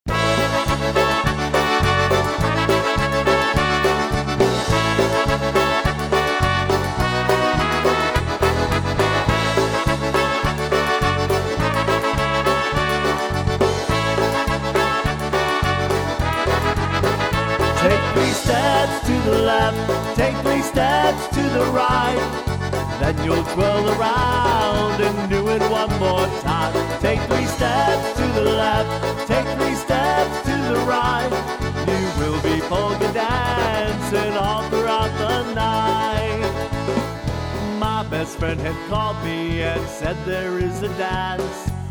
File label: Polka